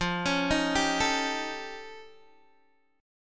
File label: F Augmented 9th, Guitar, DADGAD; F+9 arpeggiated; 15,16,13,12,12,x; chord shape